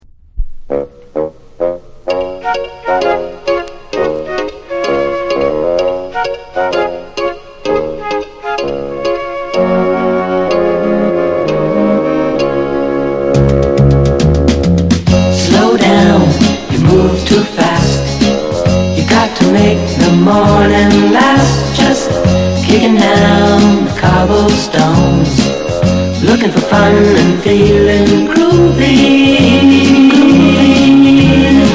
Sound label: harp